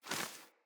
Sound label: Wing Flap One, Animals; Floppet